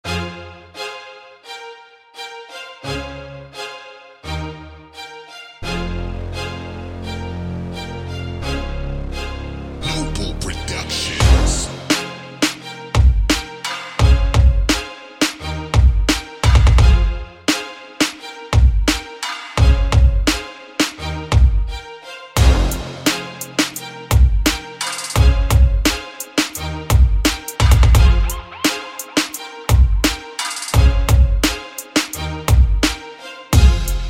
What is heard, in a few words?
Power Noise Beat